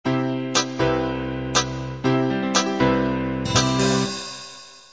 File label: half 8-16beat intro